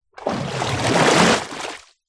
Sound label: nature water deep movement surface